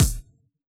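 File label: bass drum Jungle, Yamaha DJX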